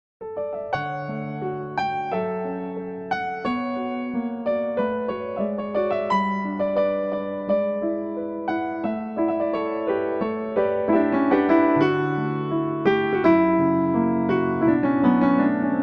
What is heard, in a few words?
Piano Cover; Pokemon Alpha Rubi Sapphire